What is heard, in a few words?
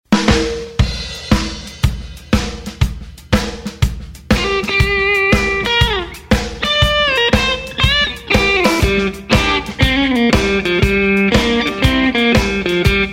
Blues